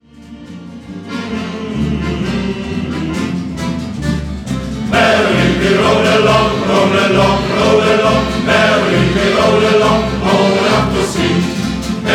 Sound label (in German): Shanty Chor